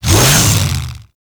Saw Melee Swing, Weapons Melee; Warframe; Grn Ghoul Saw Player

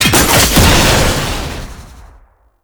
Cannister Ignite; Enemies; Abilities; Warframe; Tau; Dax Archer

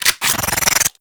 Tommy Gun Reload; Warframe; Tenno

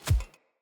Corrupted Battle Dog Walk Step, Foley Footsteps; Animals

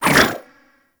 Long Melee Spin Whoosh

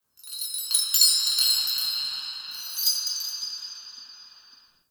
Metal Chimes Alt; Ambience; Warframe; Nokko Colony

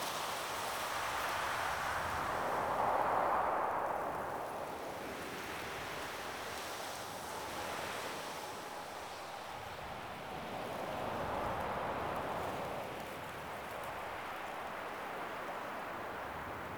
Exterior Bed Amb Loop, Ambience; Warframe; Awakening Remaster

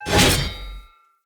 Cleaver Throw, Swords; Weapons; Player; Bromius Cleaver

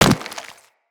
Infested Runner Step Default, Footsteps

